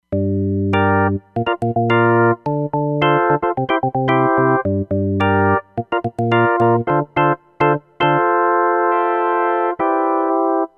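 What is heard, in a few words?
Organ, Roland Fantom XR; Rochno Org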